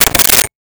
Rotary Telephone Dial

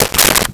Switchboard Telephone Receiver Picked Up; Communications

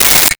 Metal Slide

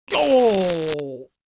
Doooh Reaction Male; People

